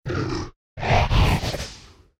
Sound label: Rock Throw Grab FLAME; Evolve; Meteor Goliath